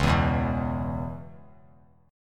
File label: Db Minor 7th Db down, chord; strummed; JGuitar